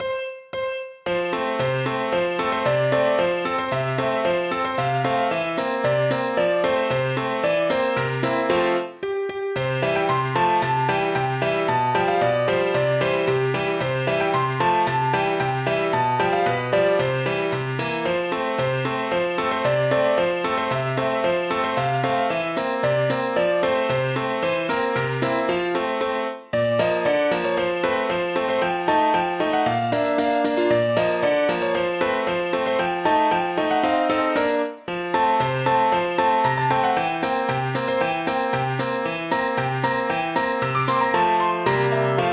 Polka

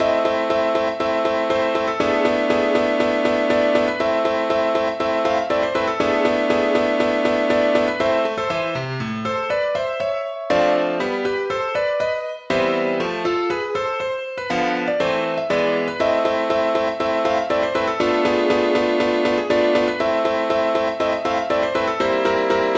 Piano Sonata No. 8 in A Major

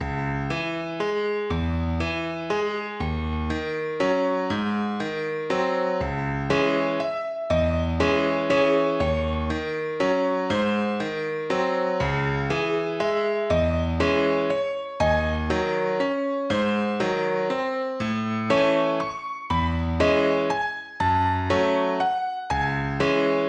Waltz of Sorrow

